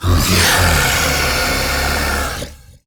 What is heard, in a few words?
Death; Warframe; MiTW